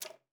E4, alto sax; Xsample VOL-2

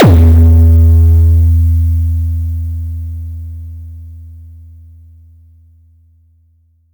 boom, Kicks; ModelCycles

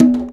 CONGA, FUNK; InVision Interactive; Percussion Slam

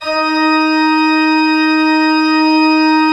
D3, Cathedral Organ

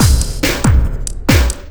Beat, Drums & Percussion; Electrocution; Beats

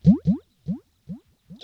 RIPPLES L, Funk Guitar; 90 s Sample CDs; Zero G

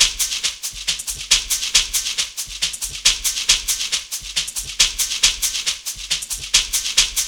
SHAKERS; Zero G - Ethnic

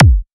Hardcore Kick

